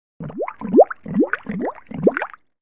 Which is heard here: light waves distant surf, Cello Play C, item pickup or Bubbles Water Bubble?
Bubbles Water Bubble